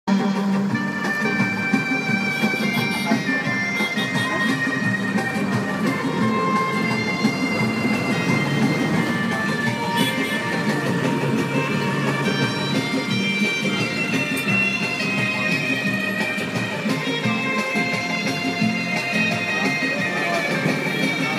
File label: Beach Restaurant Live Music: Goa; In South Goa